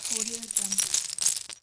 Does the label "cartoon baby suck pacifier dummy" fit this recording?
No